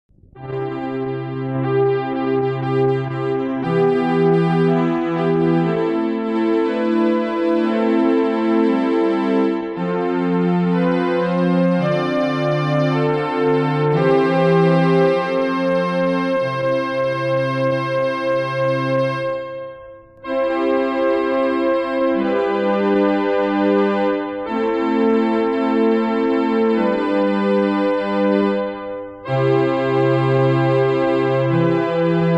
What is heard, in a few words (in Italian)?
solo musica; canto plurilingue